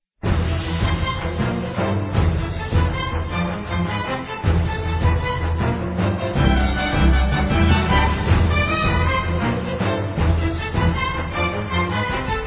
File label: BGM boss; 音效